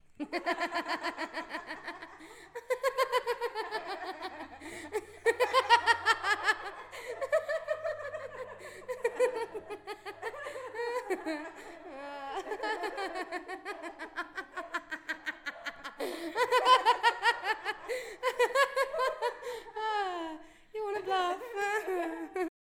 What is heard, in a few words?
People Laughing